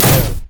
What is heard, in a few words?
rumble shield